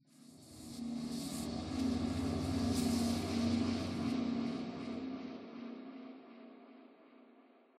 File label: Npc Combat Noise